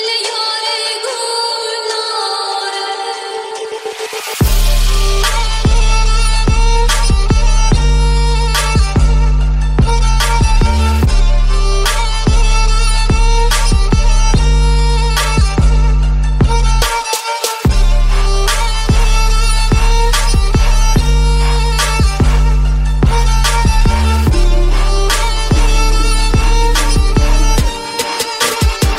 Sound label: Arabic Trap